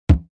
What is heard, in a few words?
balloon; Golden Crayon Sounds